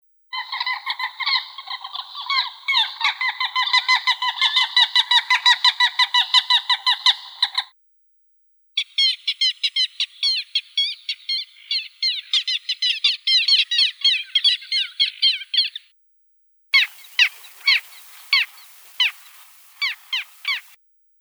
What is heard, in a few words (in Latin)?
Hydrocoloeus minutus